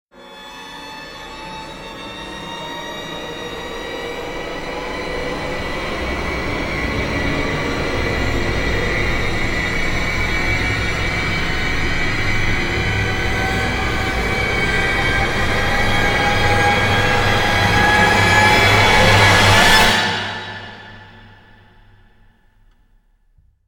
Rise

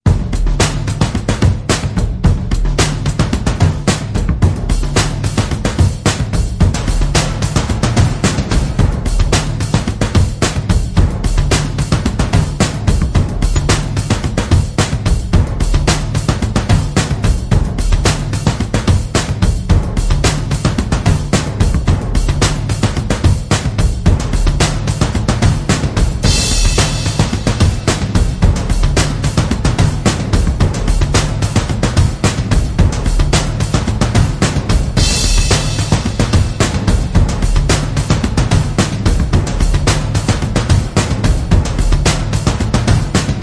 Drums; Pet Shop

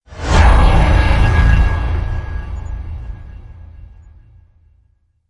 Raider Hit Deep; Ninja Tracks

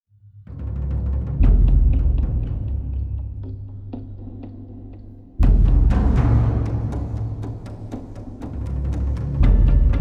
Drums Only Version

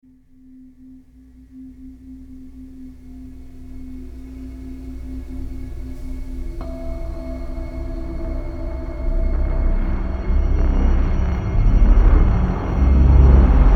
Granular Ambience; Ninja Tracks

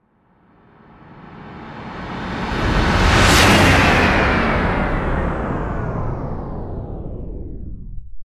Hit Downer; Ninja Tracks

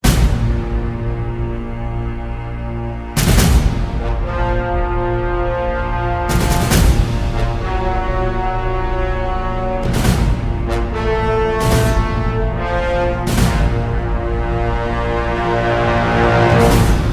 Brass (Without Choir)

